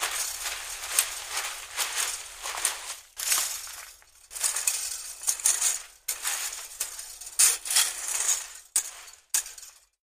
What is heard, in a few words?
Rake Leaves